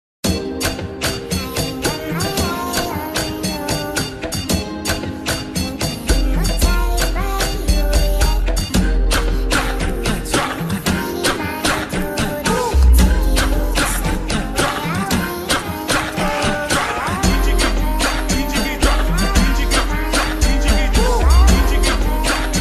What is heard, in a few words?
brazilian funk slowed